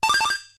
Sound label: Sub Tank Full